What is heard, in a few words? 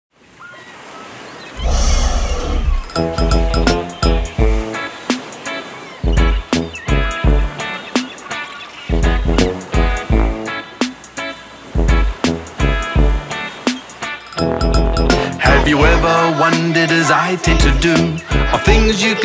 Blue Whale Poo (song)